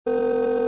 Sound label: fuelpump; ambience; Star Wars Jedi Knight II Jedi Outcast; XBOX